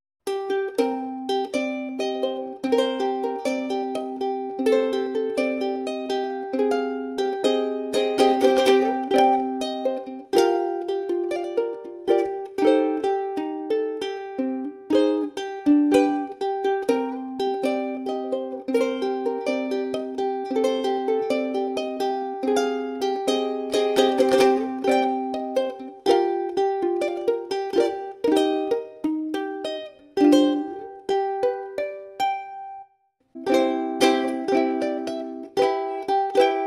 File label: uku